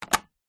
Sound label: foley battery push into plastic compartment